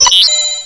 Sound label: button; tfc